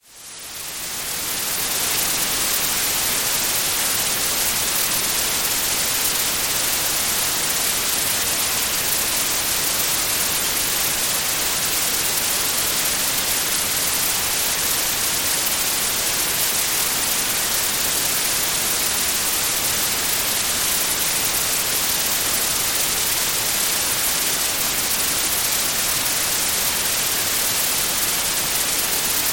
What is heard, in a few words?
classic white noise; the sleepy owl soundscape pack